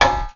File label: tap, tuba